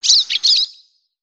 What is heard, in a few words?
3D Birds Swallow; ambience; Ninjago